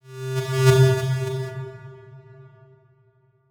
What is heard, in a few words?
Transition Whoosh Fly By; Production Element; Title Transition; AdobeCC